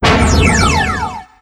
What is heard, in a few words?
Skill Power swing; FlyForInsanity